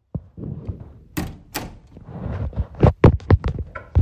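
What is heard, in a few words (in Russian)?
закрытие двери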